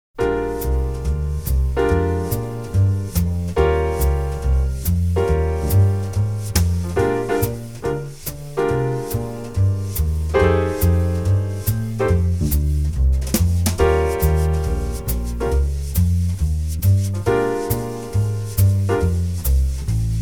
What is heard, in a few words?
I IV V Chord Roots